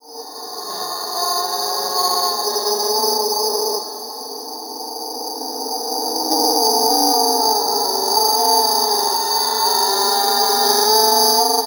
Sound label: Animist Drone; Sonic Foundry (Sony Creative Software); Loop Noir